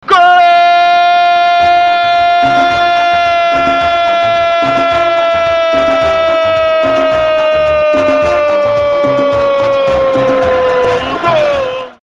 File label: Goaaaaaaaaaaaaaaaaaaaaaaaaaaaaaaaal